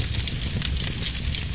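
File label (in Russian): пожар шумный хрустящий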